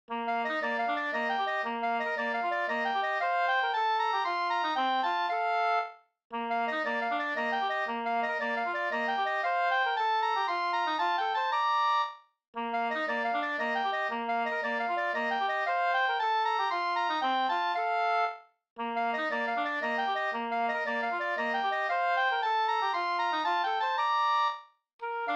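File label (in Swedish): polska, tvåstämmig; Dybeck Runa1845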